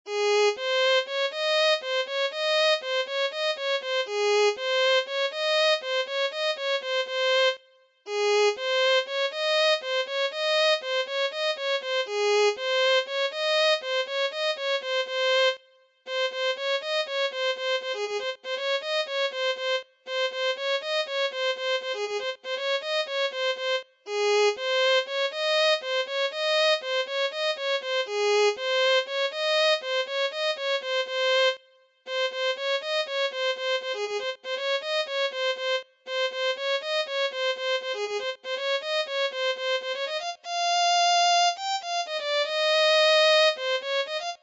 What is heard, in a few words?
Violin